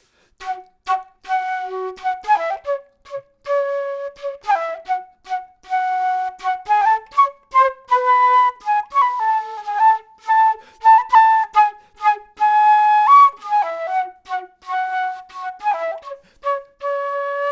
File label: flute intro; logic